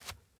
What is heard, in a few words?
dirt jump